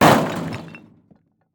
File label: Crash Brick On Car; crashes; AdobeCC